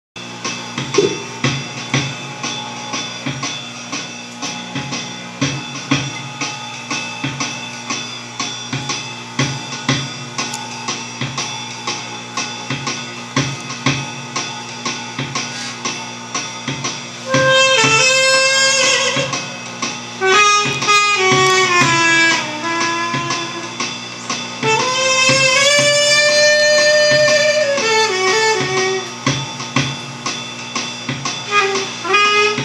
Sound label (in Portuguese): requint bolero